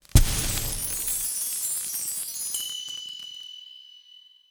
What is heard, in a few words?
Lantern Spark; The Princess and the Frog; wii windows; Hub Mansion; Twitch Escape; Lantern Sparkle